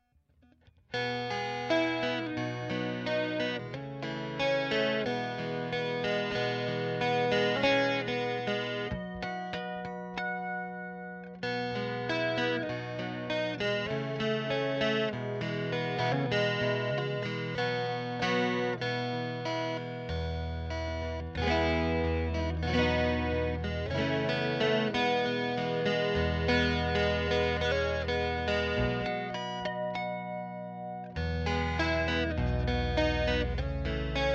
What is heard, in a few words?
epiphone 12